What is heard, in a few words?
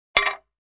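Large Tile Square Drop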